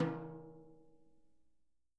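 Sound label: edge mp, Peter Erskine Living Drums; Sampleheads; JZ XTOM 1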